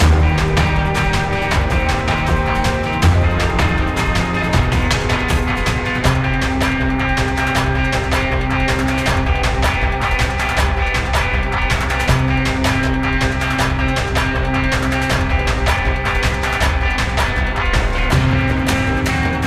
Combat Winning; Gl Rook Combat; Griftlands

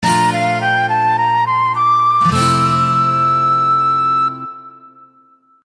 Jingles Inn; otome the exorcist

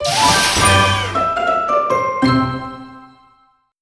Crunchy Finish

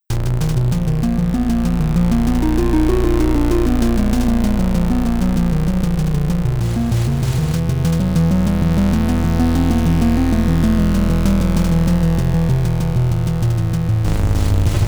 Chiptune; Shadow Valley

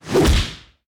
reveal enemy appear; Animation Throwdown; cardbabies

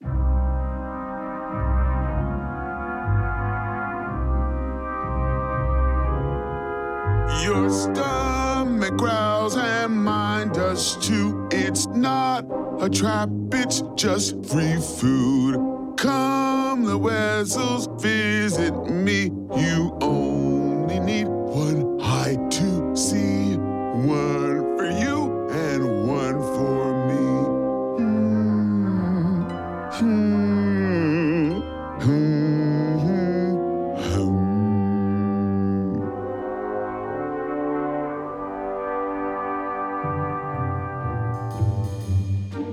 Lullaby; King's Quest Chapter 1 A Knight to Remember; Wedzel Wolf

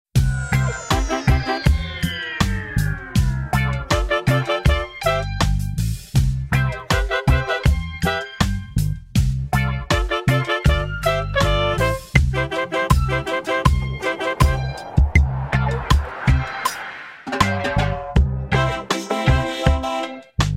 Battle Reggae; Digicalized; Android

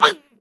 scream land; Scrat

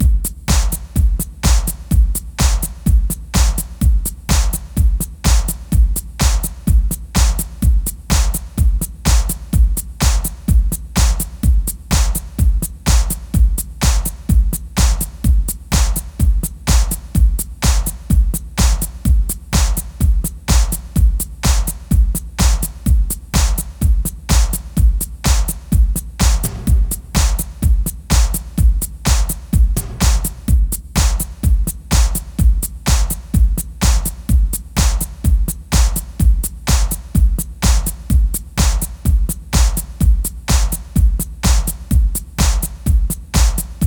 Music Percussion; Plants vs. Zombies Battle for Neighborville; TurfTakeover MiniGame CM

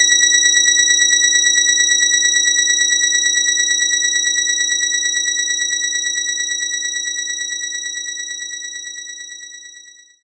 Organ Slow A4

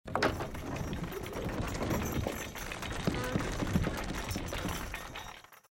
trebuchet stretch; out of reach treasure royale 2020 gamerip pc

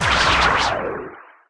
Knockdown; The Denpa Men; Android

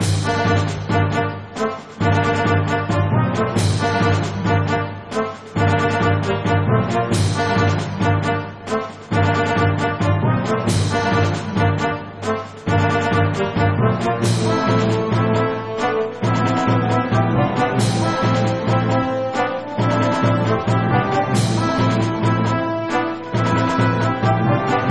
battle; Mercenaries Saga 2; Android

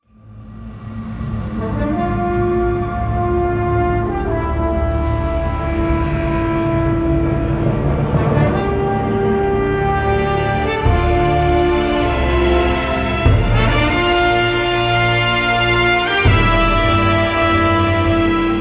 Motivational Epic Orch; Crysis

